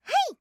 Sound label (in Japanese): 攻撃